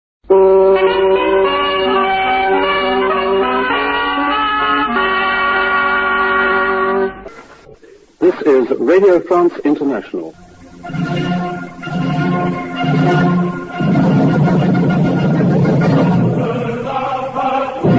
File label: interval signal; rfi c1975